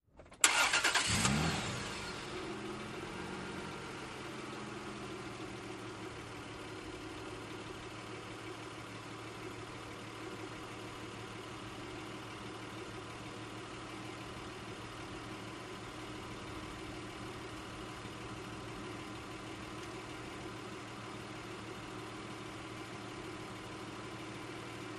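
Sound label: SUV Start Engine Idle Off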